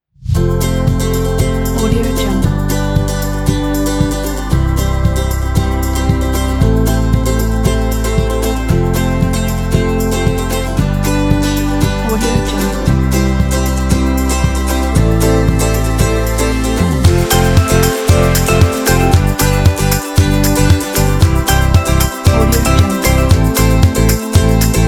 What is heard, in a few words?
happy acoustic holiday summer slideshow